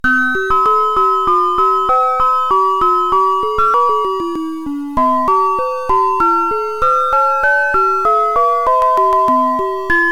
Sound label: sine tri